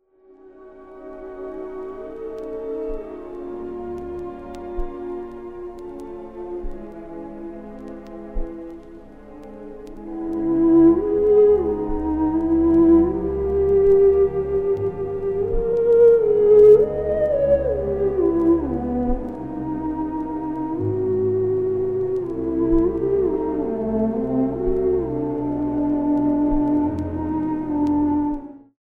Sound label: ondes martenot; syntezatory; ondes martenot jolivet